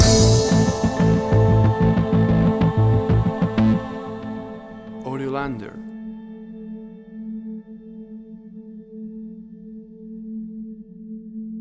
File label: Action Hybrid Dramatic Trailer Music Reverse